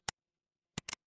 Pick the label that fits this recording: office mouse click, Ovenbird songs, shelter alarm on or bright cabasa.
office mouse click